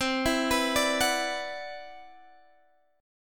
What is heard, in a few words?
C Major Sharp 11th, C, down, Guitar, Open G